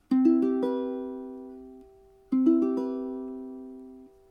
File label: chord cmaj7, ukulele